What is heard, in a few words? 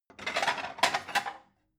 plate multiple take